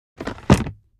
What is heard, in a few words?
Airplane interior passenger tray table up; vehicle